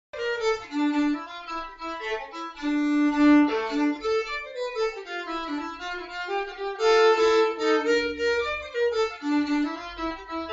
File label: jig